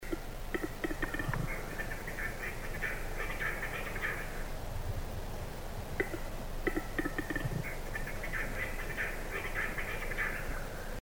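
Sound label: capercaillie display song; glux tok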